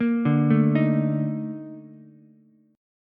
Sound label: A sharp Major 11th Ukulele, chord; A#M11; arpeggiated; JGuitar